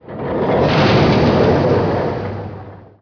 Minisub Clatter; Playable Tutorial Level; TR2

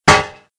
Gladiator Shield; Playable Tutorial Level

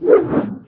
Swing; Playable Tutorial Level; TR2

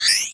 Save Crystal; Playable Tutorial Level

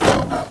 Lion Hurt; Playable Tutorial Level; TR1

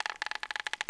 Rope Creak; Lara; Playable Tutorial Level

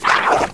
Atlantean Attack; Playable Tutorial Level; TR1

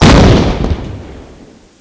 Atlantean Explode; Playable Tutorial Level; TR1

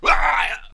Punk Death; Playable Tutorial Level; TR3

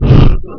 Yeti Growl; Playable Tutorial Level; TR2

